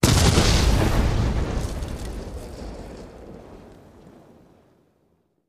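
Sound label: Explosion Wood Debris; wood & sand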